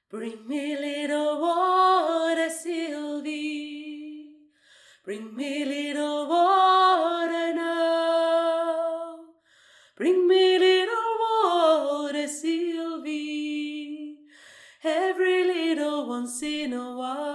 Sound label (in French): voix principale milieu; BRING ME LITTLE WATER